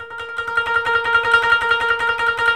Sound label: KOTO TREM